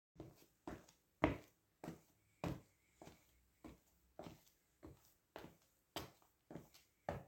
Steps

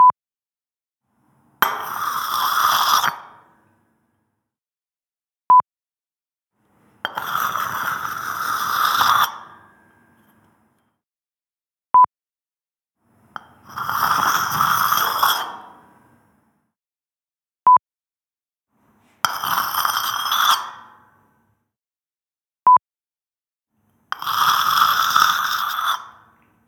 Dry Grinds

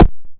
Button

